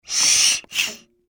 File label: Household Bee Smoker Pump Pesticide Single; Yard Tools